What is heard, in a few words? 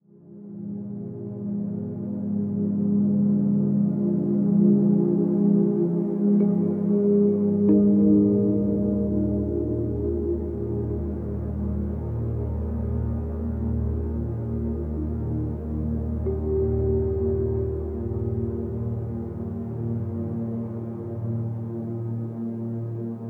432Hz Study Ambience